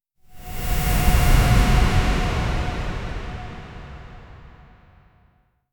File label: cinematic soft swoosh tra